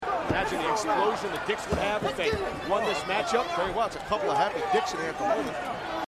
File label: explosion; the dicks